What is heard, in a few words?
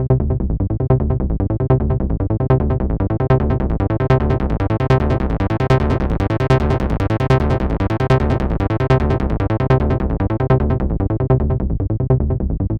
Plastic Bass, acid trance techno; acid trance techno vol1